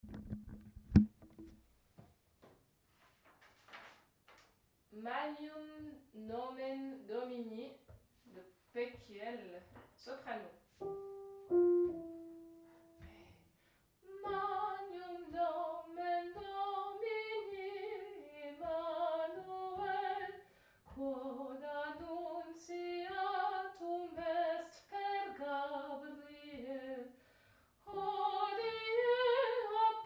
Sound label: magnum nomen domini sop